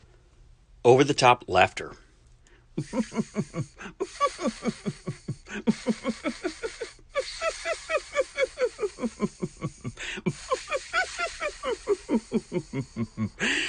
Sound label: Over The Top Laughter